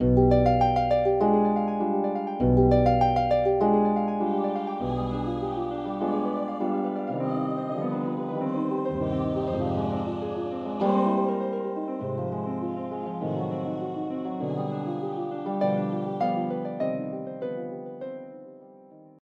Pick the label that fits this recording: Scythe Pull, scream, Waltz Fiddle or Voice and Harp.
Voice and Harp